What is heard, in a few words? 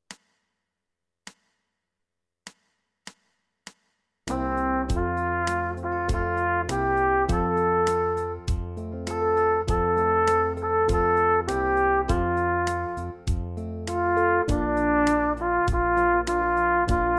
Worried Man Blues